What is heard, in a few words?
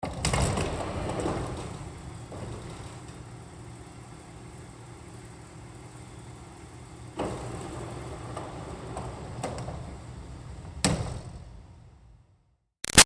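Door Automatic Sliding Store open-close; Sliding Doors; Door Automatic Sliding Store O-C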